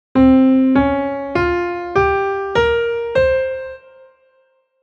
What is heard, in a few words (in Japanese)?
陰音階音源